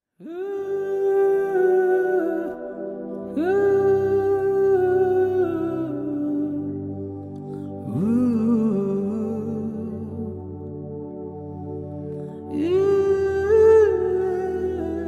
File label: Holy Ghost Humming Melodies